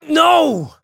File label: battle pain; dqxi; erik